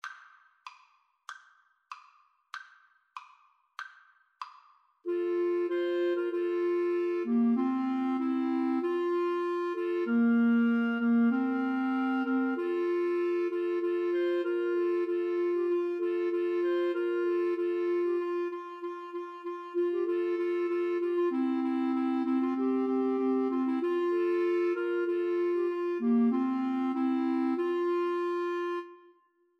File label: Clarinet Trio